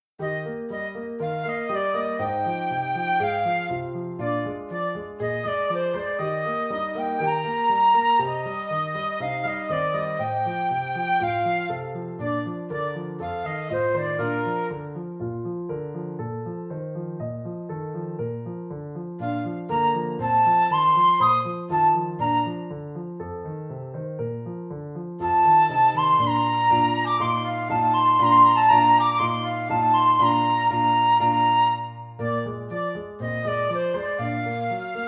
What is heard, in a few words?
Flute Sonatina Melody with Acc